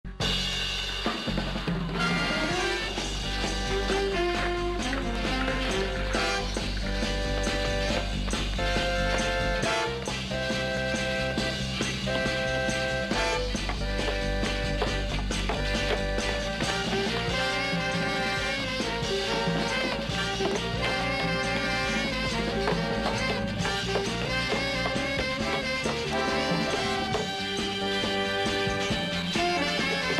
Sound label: music